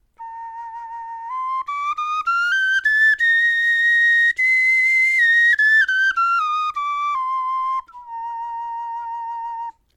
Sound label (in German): tonleiter, knochenfloeten